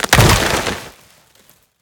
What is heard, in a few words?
ice smash; Scrat